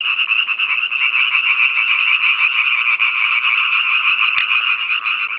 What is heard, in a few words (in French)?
Rainette; WWF Rangerclub; Boomkikker